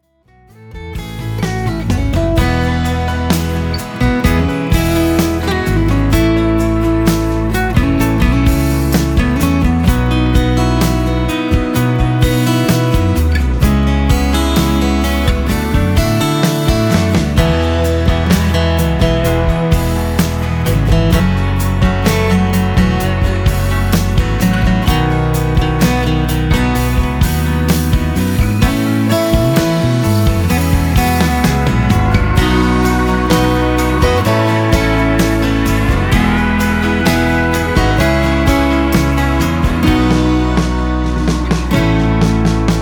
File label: Acoustic